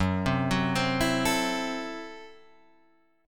F sharp Minor 7th Flat 5th F sharp Guitar Half Step Down